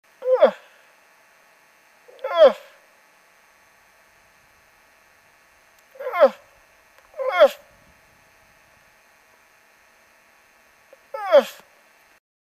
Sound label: Old Cow Come Get Me Big Boy, Moose